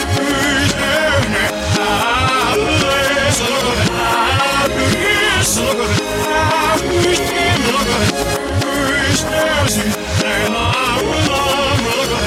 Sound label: backwards